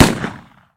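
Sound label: loud clear short gunshot sound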